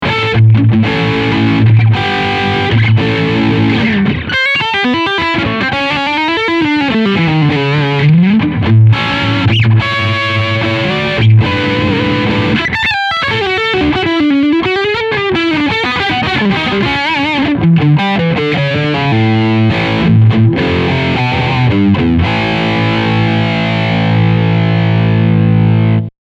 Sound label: Full Gain